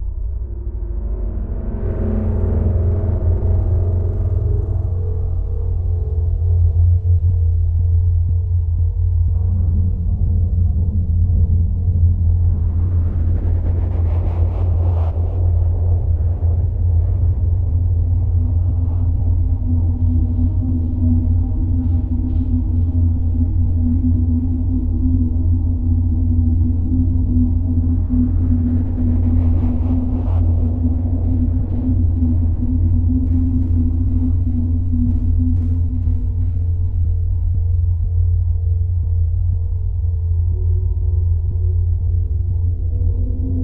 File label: ambi lava, Ambience